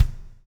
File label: BLUES TK, Backbeat; Spectrasonics - Backbeat